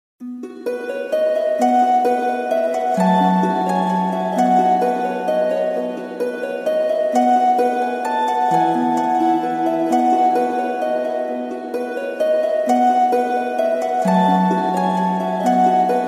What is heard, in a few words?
Celtic Music Fairy Forest